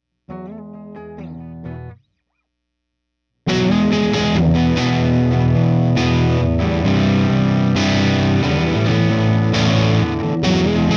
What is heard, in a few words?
Overdrive with medium gain; earthquaker devices